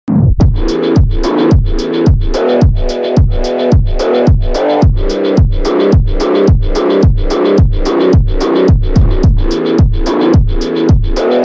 phonk

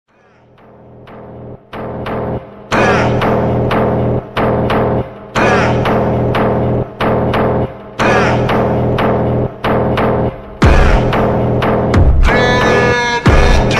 slowed reverb; Cristiano Ronaldo

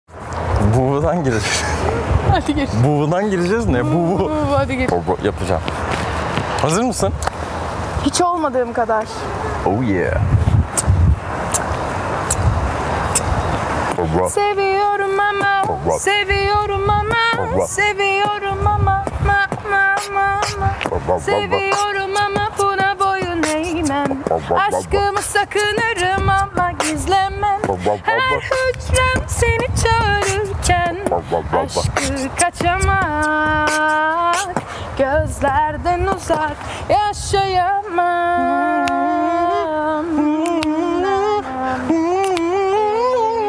beatbox remix

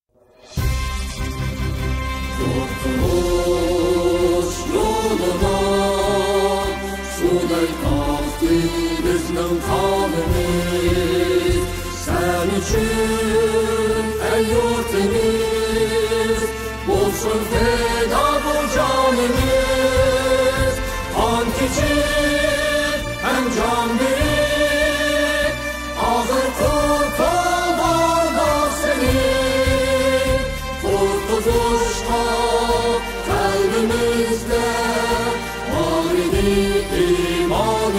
national anthem; east turkistan; dogu turkistan milli marsi

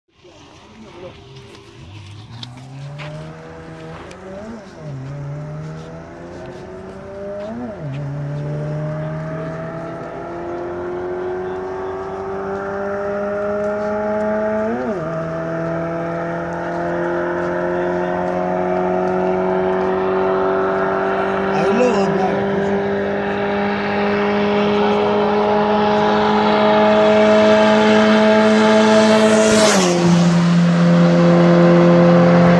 toyota karolla; turkmen; forsage; karolla vs 2106

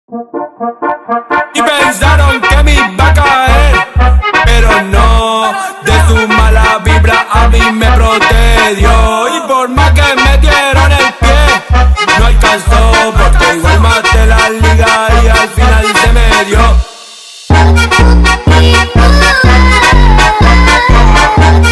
funk do bounce brazilian funk

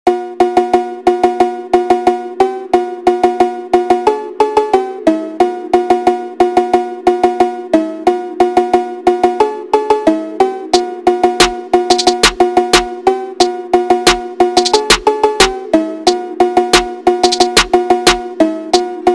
phonk hard bass boosted

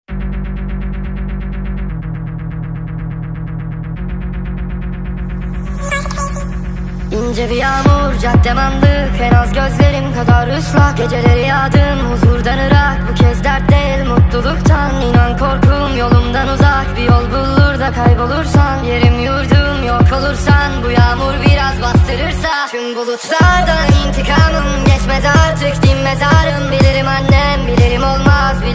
speed up

